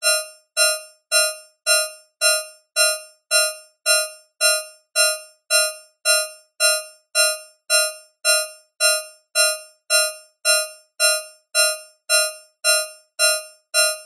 science fiction alarm fast high pitched warning tone emergency